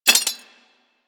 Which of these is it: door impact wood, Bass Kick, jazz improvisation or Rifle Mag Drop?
Rifle Mag Drop